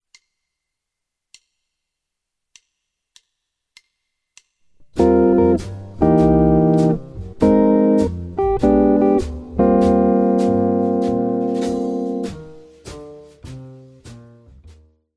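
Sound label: lick, guitar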